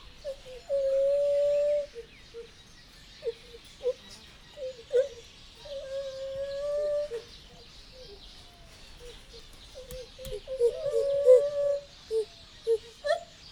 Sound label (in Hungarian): felvétel csontra kisebb neszek, fehérkezű gibbon; jaszberenyi zoo 2015